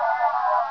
scan, science

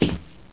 metal, player; mistake coop

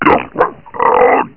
die; svencoop; uboa; ichyhakase

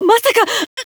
barnacle; svencoop; player sounds; lappland

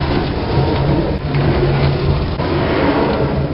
push box; svencoop; lostmaze

